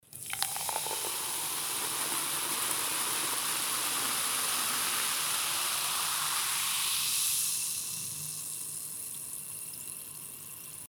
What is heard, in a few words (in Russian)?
zvuk gazirovki